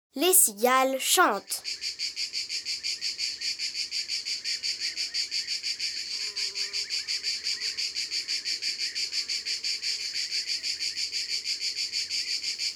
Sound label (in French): Les cigales; mon imagier sonore de la nature